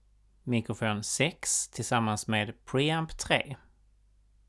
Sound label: mic pre